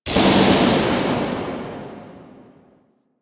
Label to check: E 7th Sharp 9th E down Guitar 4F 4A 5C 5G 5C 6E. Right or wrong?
Wrong